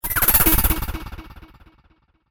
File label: Vibrating Rattle Echo; Miscellaneous Cartoon Sound Effects